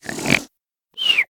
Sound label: Zombie Snore; Zombie Catchers